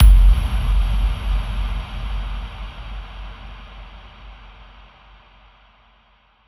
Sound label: electric explosion; Crash Bang Boom